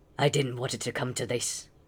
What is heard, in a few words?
Male Reactions